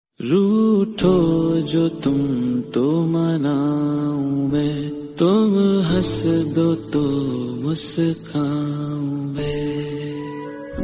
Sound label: SAD SONG RINGTONE; RADHA KRISHNA SERIAL